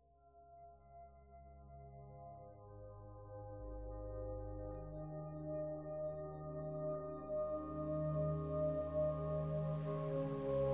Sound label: The Ocean